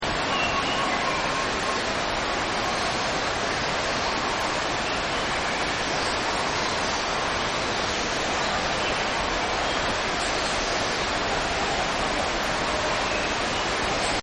Jungle Waterfall; Nature Scenes; Backgrounds; Forests and Jungles

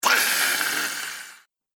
Scream Vampire, Monster Sound Effects; horror sound effects

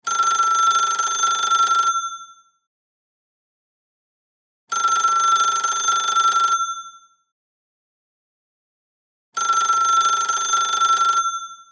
Telephone Ring Electronic; Antique Loop; Technology; Telephones; Electronics; Blastwave

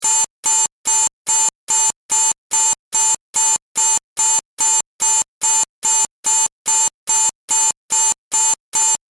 Digital Signal Short Circuit Klaxon Alarm; Digital Clicks, Blips & Alerts

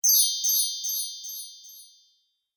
Fairy Magic Wand; Magic Sound Effects

